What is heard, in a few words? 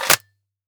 mac10 mag in